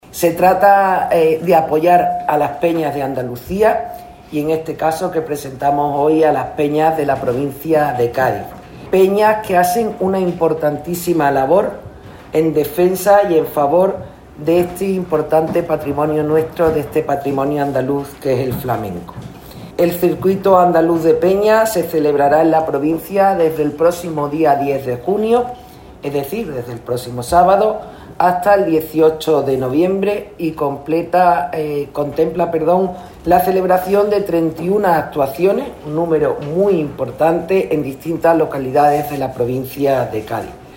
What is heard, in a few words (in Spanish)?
FLAMENCO